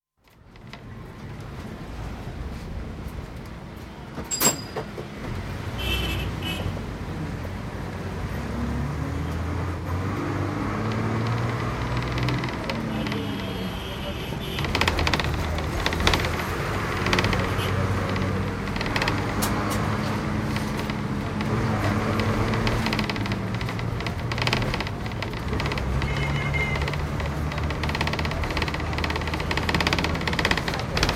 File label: Mumbai: Bus, interior, with rattles, creaks & occasional distinct speech, bus stops twice; India; City; BBC